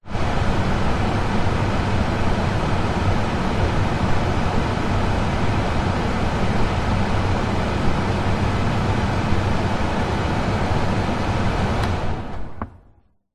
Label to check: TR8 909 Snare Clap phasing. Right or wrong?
Wrong